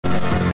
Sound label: rewind